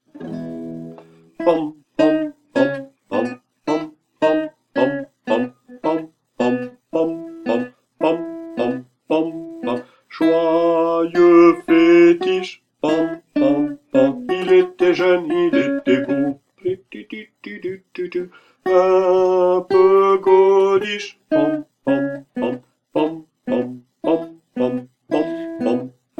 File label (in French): alto, chorale